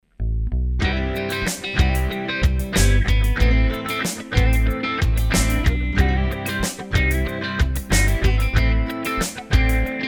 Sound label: country